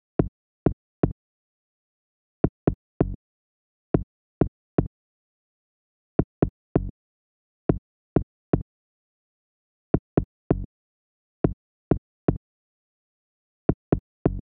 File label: low bass, Key F# Bpm 128; Big Room House; Loop Nerds; Big Room House Allstarz